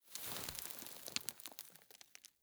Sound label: Sand Basket; Counter-Strike Global Offensive; PS3 Windows Xbox 360